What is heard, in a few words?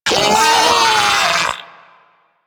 Arachnoid Vocal Death; Warframe; Corpus; Arachnoid Hunger